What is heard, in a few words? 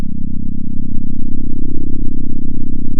center, sound of a small motorcycle